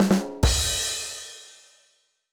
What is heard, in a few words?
rimshot